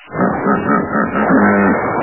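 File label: big hippo makes strange sounds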